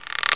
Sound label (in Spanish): Trompeta Apunta; Grounch